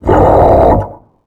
biomech air vce hurt

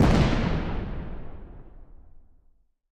tank explosion far; War Thunder; tanks

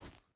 footstep boots forest jump start; Rust

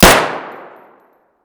pm indoor close; Escape from Tarkov

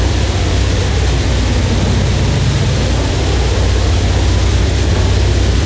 Uh-1H Wind Noise; Aircrafts; DCS

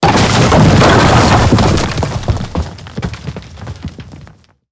building fall mid

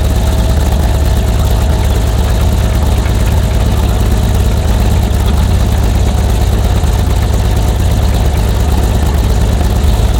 hetzer engine rpm 33 load, tanks; War Thunder

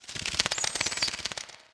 bat; World of Tanks Blitz; karelia halloween

